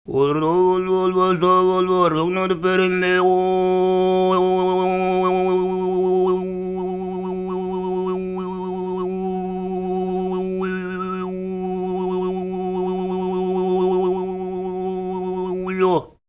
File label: SING, TUVAN MID; Spectrasonics Vocal Planet CD5; World Voices